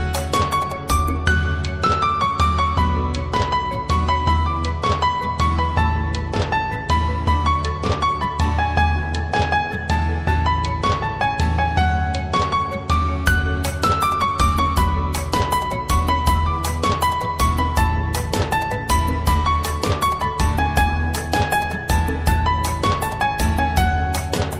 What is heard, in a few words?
Mobile Ringtone Instrumental Ringtone Romantic Ringtone Love Music Ringtone